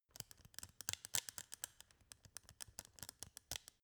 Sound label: scissors handle